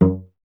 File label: STR VC F#1, Cello; Miroslav Vitous; Solo Instruments VOL-1